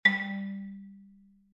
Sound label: tone